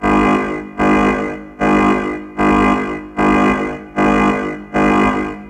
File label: Ship Warning Loop